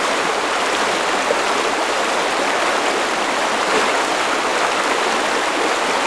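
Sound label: water river; envrn; The Elder Scrolls III Morrowind GOTY; XBOX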